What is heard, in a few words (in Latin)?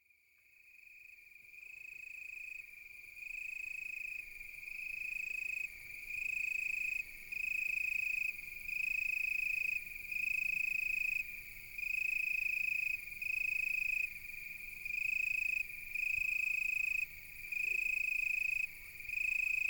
oecanthus